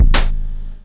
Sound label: Electron Sidstation, Drum Machines